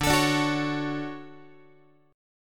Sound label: D Major 7th, Guitar; strummed; JGuitar